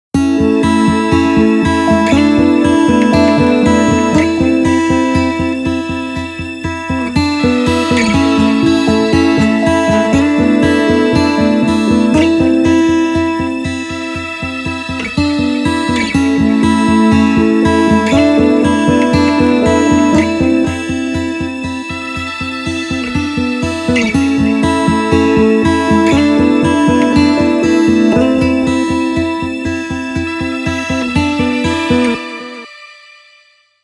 Nokia Tones; Windows Phone apps; Angle

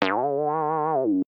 message tone; Sony Ericsson; MH907

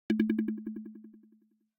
Low Battery, notifications; Yota Yotaphone 2

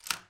Lock

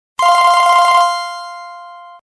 Basic tone; Samsung SGH-i550

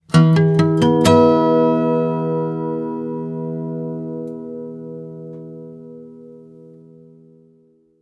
Acoustic

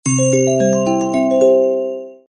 Rotating, ringtones; Sony Ericsson; F100i Jalou

